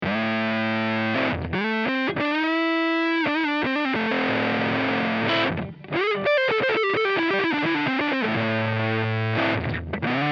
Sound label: Fuzz, guitar